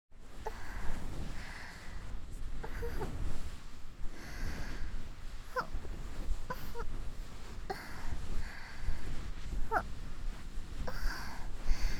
ヘッドマッサージ; 癒しの音 詰め合わせ♪

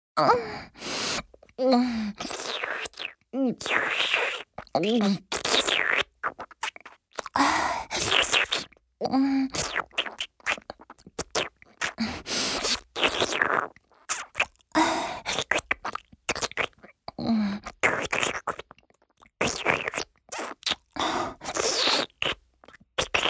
お掃除フェラ(中)：亀頭を咥えて中に残っている精液を吸い出す, ASMR; 実用ボイス抜粋集

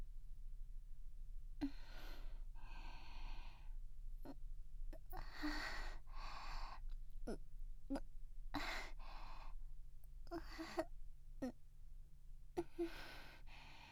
小さな喘ぎ

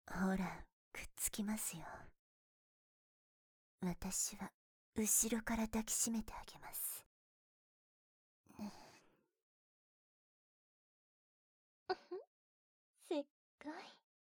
キス＆耳舐め 効果音なし; 女性自警団MUTE; 痴漢取り締まり+密着更生プログラム（こずえ×えりか）; 痴漢更生プログラム1～サンドイッチでキス＆耳舐め～

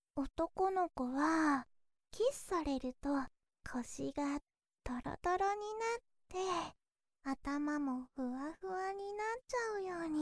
キス; Hチャンネル

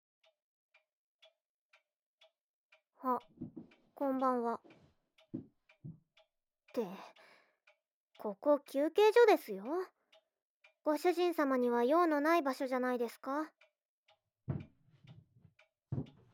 バーチャルマッサージ; 3DASMRメイドシリーズ

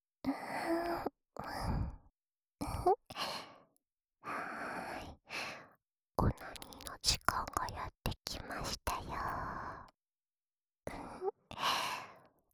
オナサポ用耳舐めループ, ASMR

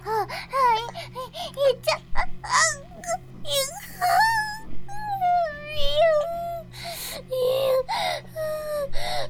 バイブ放置, ASMR

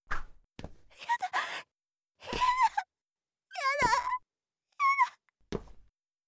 逃避本能

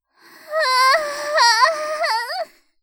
"はああああん！", 妹; ハイグレボイス集

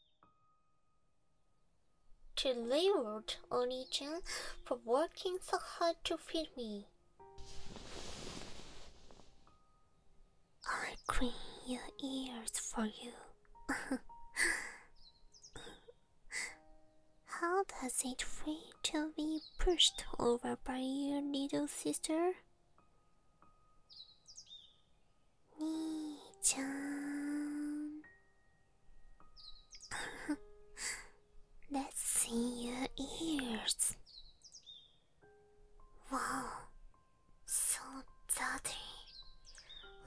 左右耳かき

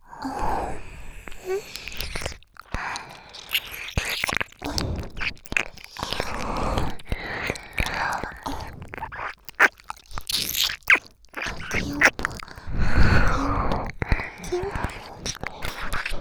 耳舐め; 静華; お屋敷メイドのハーレム淫語ヘヴン 気が済むまで耳舐め淫語を堪能できるバイノーラルオナサポ音声

